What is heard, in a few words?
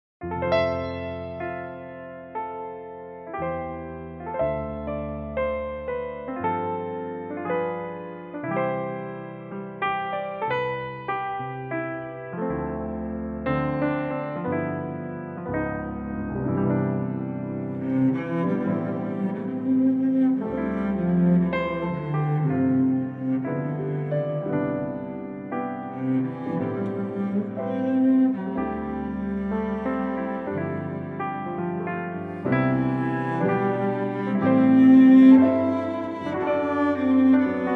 cello, C